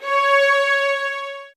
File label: C, soft violins; Q Up Arts; Denny Jaeger Master Violin Library